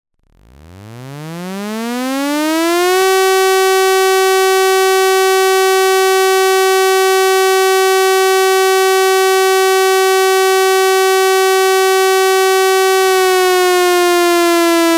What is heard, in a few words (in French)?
signal national d alerte